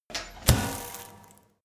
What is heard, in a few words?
metal pedal bin close